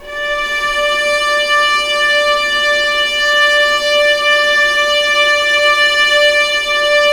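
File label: D3 legato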